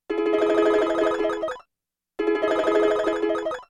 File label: Pseudo Video Game Loop In 4 Time